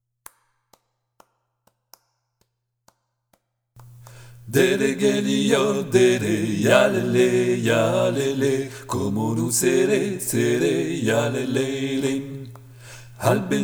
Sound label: Dere geliyor tutti